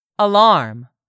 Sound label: ALARM